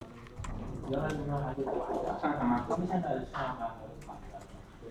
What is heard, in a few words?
song